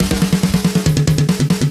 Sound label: Fills, Loops 140 BPM